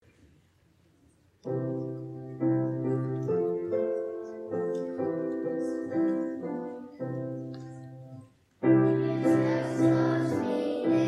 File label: children, sunday school children; Jesus Loves Me; Sunday School Performances